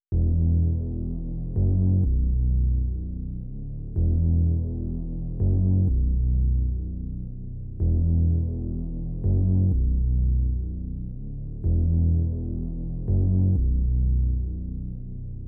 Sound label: 125 BPM B Min Bass; Cymatics; Hide N Seek; 2022 Melody Collection Platinum Expansion